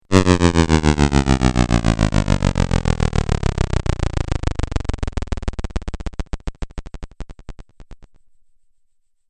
Falling Video Game

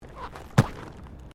ROLLERBLADING BITUMEN SMOOTH JUMP; Sports

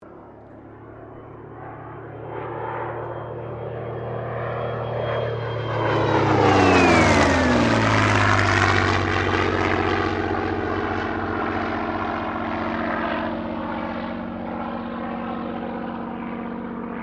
AIRCRAFT SUPERMARINE SPITFIRE MK-XVI PASS; Airplane; Airplanes; Vehicles

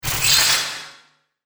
Fantasy Wrath Of The Harpy; Monster Sound Effects

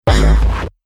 Laser Percussion Shot, Laser Gun

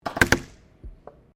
GUN TOY DART SHOTGUN DOUBLE FIRING; Toy Guns (Sound Effects:Toys)

